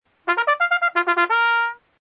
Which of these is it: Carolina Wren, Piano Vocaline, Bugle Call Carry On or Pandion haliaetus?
Bugle Call Carry On